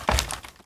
Wood Ladder; moul-assets

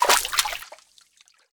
Water Walk

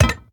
Maint On Glass Walk

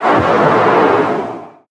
Fumerol Mfld Blast

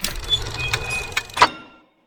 Ring Puzzle

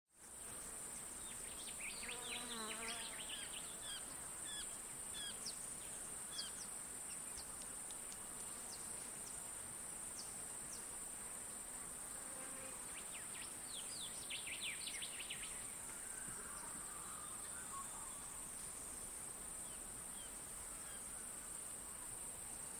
Insects; EderBahv; moul-assets

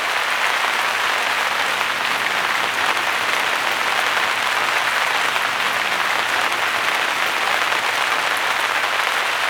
Canopy Rain Loop

